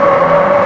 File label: skid; RealCarSim